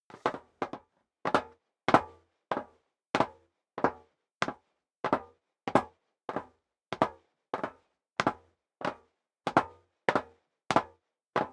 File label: step sneaker metal dry downstairs sequence; schritte